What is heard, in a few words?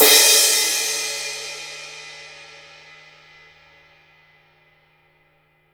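CYMBAL, real drum kit; HIPHOP KIT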